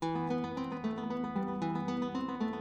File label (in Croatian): gitara